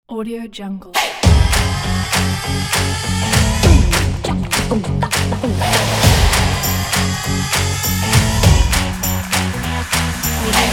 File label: action rock logo; smartsounds